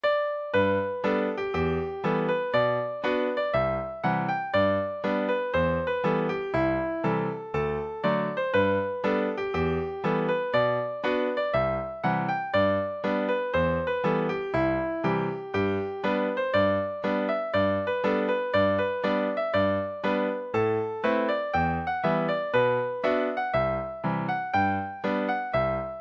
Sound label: traditional air, scottish guitar